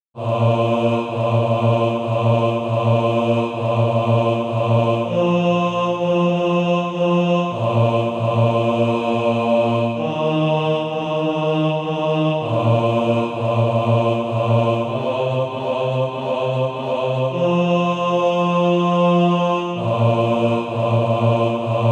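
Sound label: bass, SATB Practise Music; Dwelling in Beulah Land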